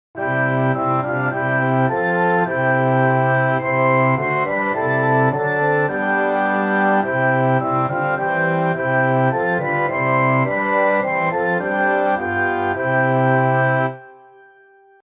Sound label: Orchestra